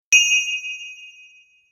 シュピーン; 効果音

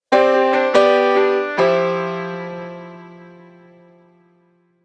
オープニング 予感 BPM154

